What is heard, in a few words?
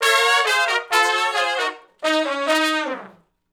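R&B Riff (Eb) harmony, Horncraft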